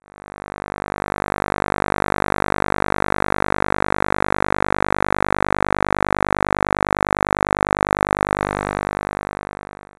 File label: 1kHz sine only every 20th half cycle